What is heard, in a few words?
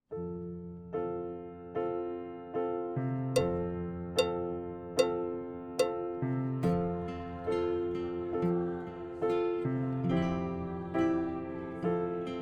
Backing; Section A